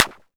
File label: LFO Clap, Jomox Xbase-09